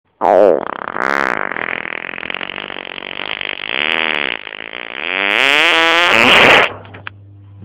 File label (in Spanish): Pedo de rellano